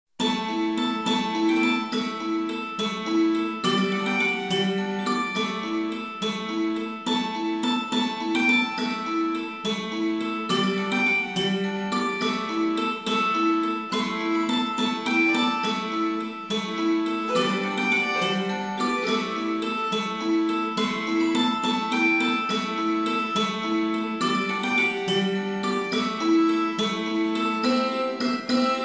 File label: Tavern; Neverwinter